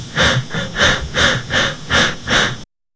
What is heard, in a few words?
scrolling in bed